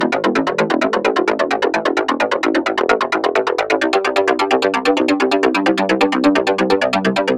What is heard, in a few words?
130 A Seq; Shadowrunner; Zero-G - Future Wave